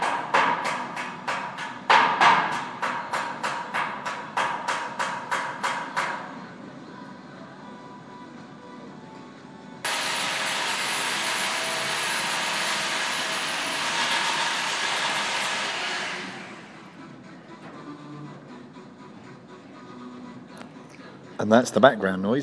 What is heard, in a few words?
background noise